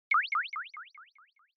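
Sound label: Onomatopoeia Negative High Delay; オノマトペ ネガティブ; 高 ディレイ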